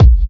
fat bass drum single shot a sharp key, kick drum sample tuned to the A# note